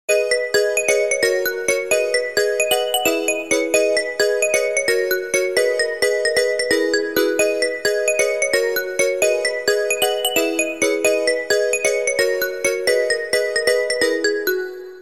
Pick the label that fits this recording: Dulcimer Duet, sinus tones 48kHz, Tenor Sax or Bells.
Bells